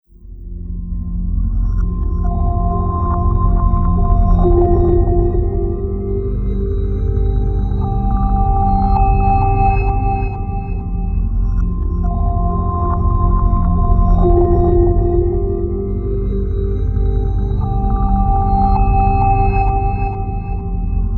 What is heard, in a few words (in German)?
telefonansagen musik ocean